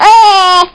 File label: death; babyboom